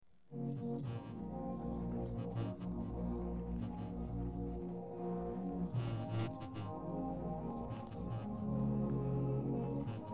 no vocals

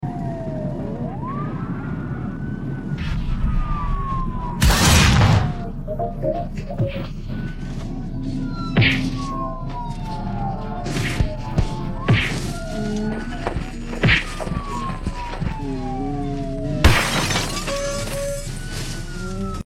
no vocals

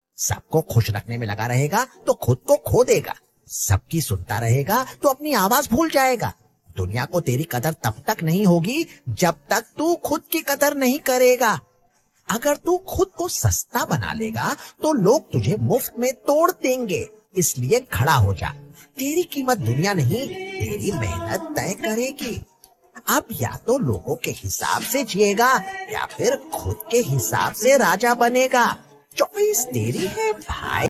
vocals

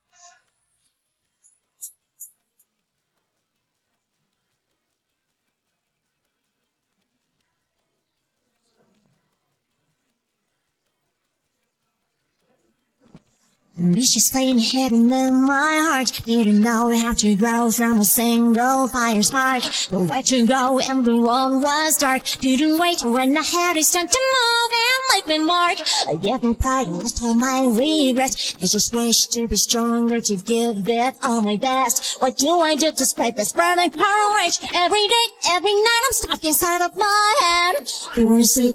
English version vocals

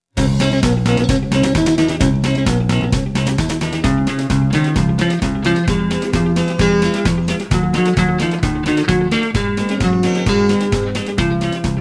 no vocals

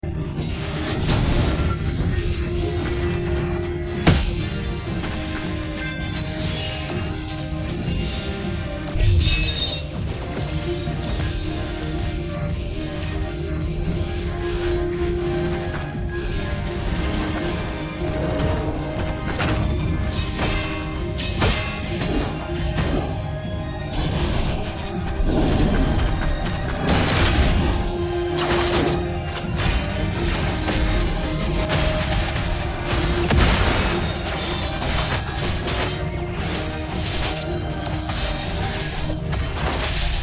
no vocals

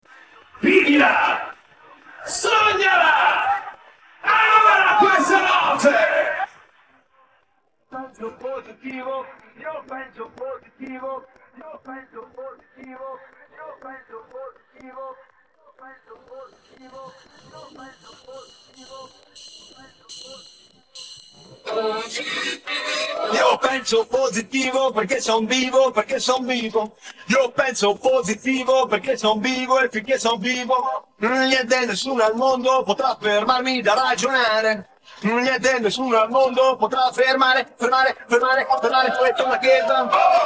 vocals

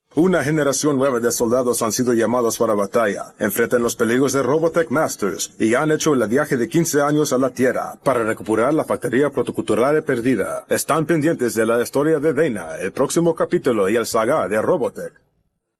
vocals

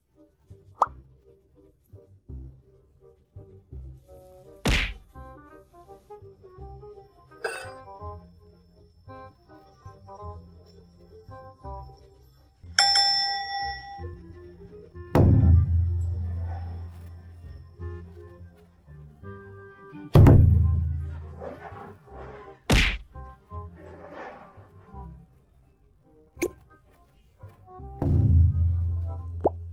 no vocals; 할머니vs삼촌

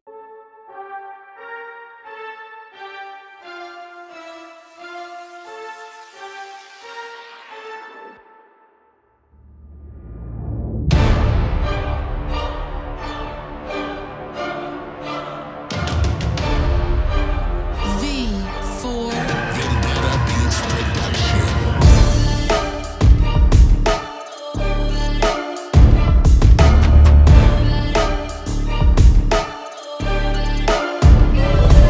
epic aggressive rap beat, hip hop

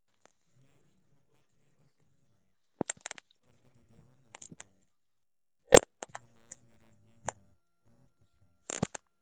no vocals

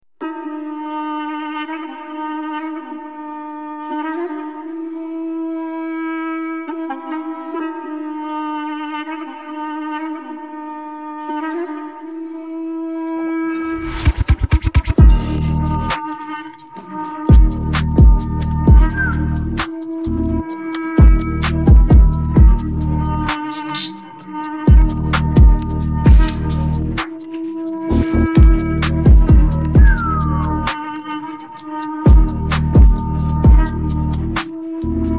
no vocals

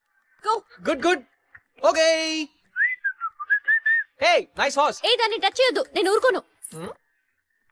vocals

